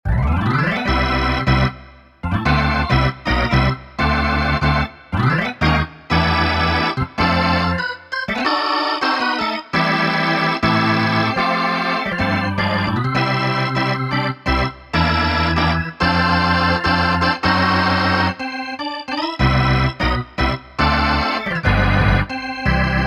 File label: organ; cms weihnachtskalender 2024 freeware